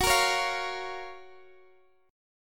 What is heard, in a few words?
Gb Major 7th, Guitar Standard; GbM7; strummed; x,x,16,15,14,13; down; capo=3